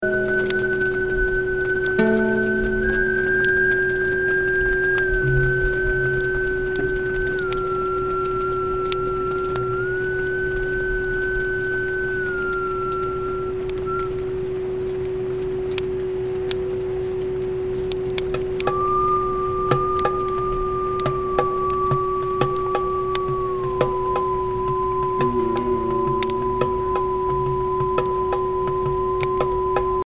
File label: broken signal